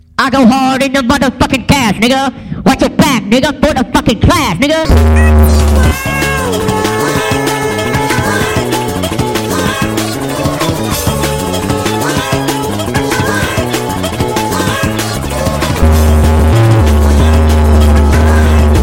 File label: RINGTONE